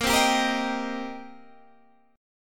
C Minor 7th Bb

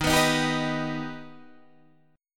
D sharp Augmented, Guitar, Standard; strummed; 11,14,13,12,12,11; JGuitar

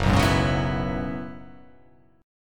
F sharp 9th Suspended 4th F sharp, chord

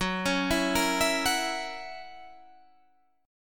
Gb 7th Gb down Guitar Standard, chord; arpeggiated; JGuitar

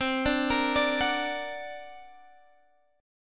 D Augmented 7th C, guitar

